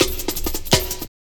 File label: Lym Cut, Jungle, Drum Loops 165.5